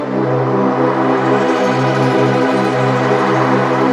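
122 BPM Pads C major A minor Tonic C, ambient synth pads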